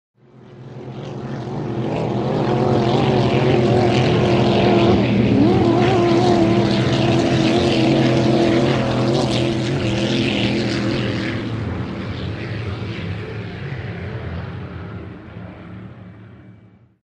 In Outboard Boat By, motorboats

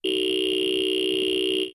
Button Buzzes Robot C

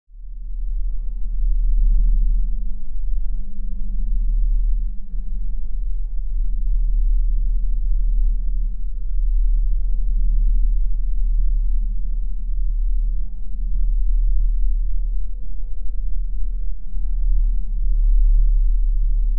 Bed Ethereal Space Po

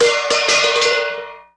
Hubcap On Asphalt

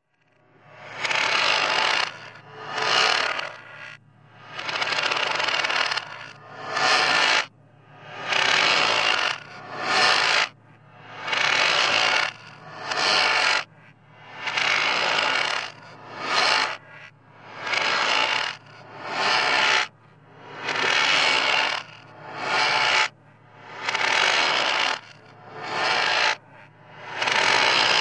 Rocking Chair Eerie, creaks

